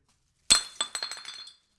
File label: Glass Bottle Bounce